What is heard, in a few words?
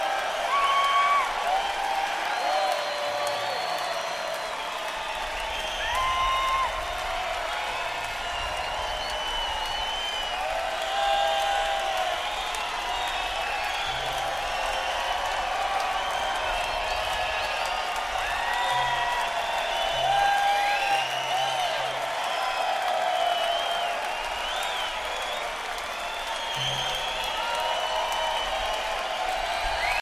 Encore Crowd